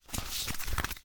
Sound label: card open